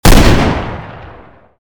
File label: toz34 fire; STALZONE